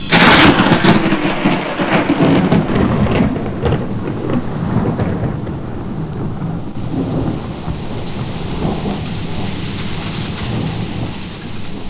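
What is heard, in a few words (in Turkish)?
yağmur başlangıç; doga; efekt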